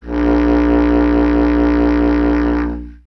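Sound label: wind bass clarinet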